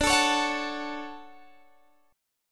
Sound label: D-Major 7th, D